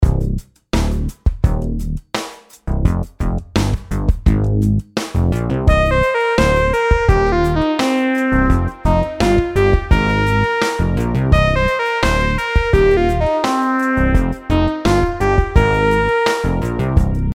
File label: rhythm simple 8s triplets